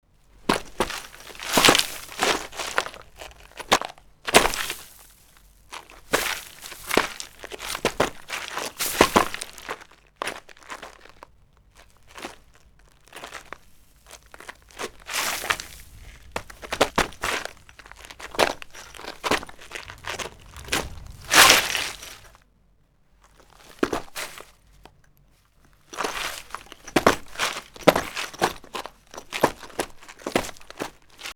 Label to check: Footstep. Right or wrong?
Right